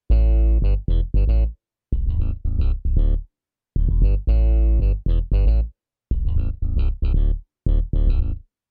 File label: Layering Bass Sound